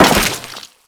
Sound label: Crewman Step Goo, Footsteps; Warframe; Corpus